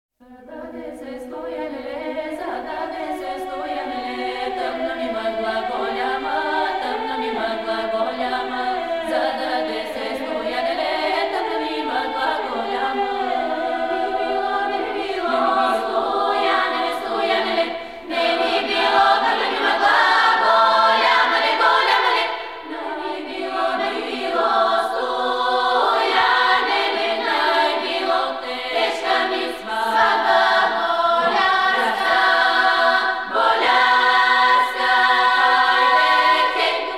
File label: Bulgarian Women s Choir Svatba; болгарская народная песня